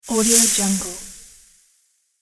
snake hiss; yellowbreadmusic

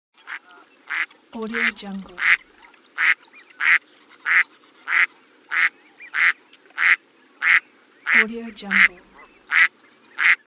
duck quack

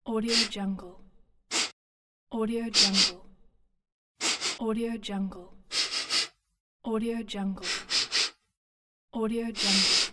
sniffing

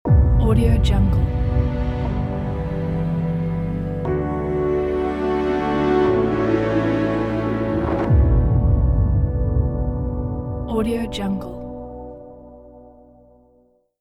earth shattering logo

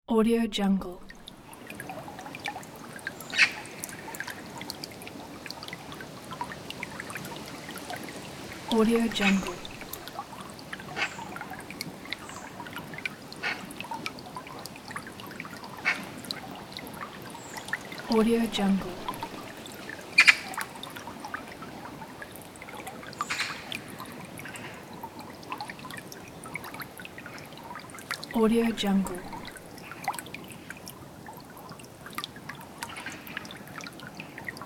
creek in forest

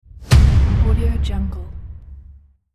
epic bump

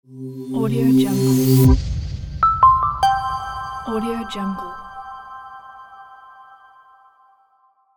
marketing logo

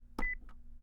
office phone dialing beep

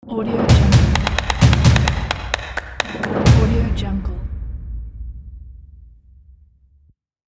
claps logo

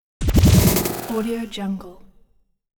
record scratch with flutter

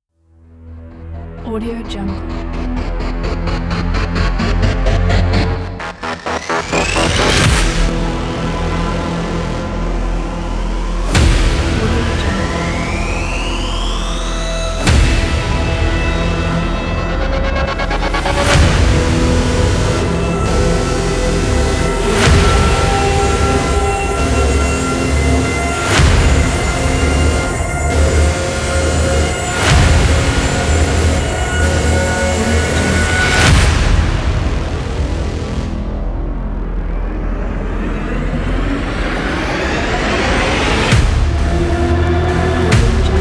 mechanical; music sx

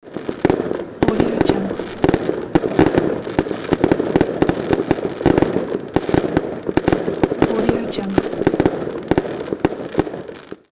fireworks

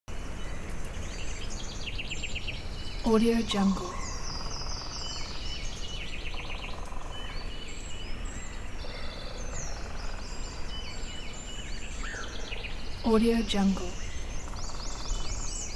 atmo forest forest loop day; smartsoundfx

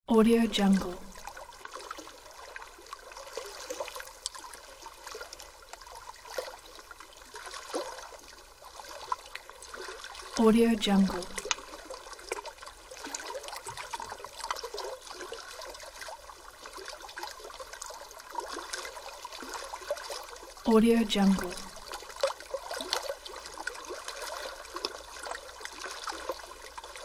forest lake

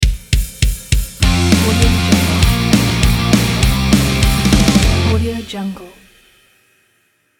punk rock logo

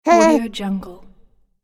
newborn baby grizzles

